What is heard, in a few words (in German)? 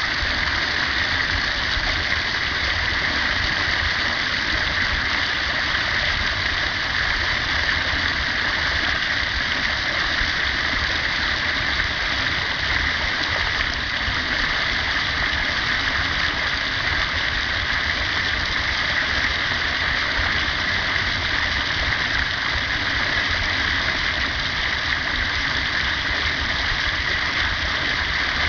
Brunnen Grundschulpark